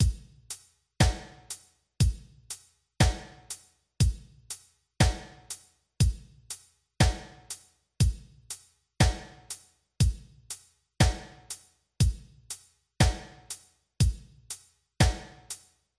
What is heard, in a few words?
drums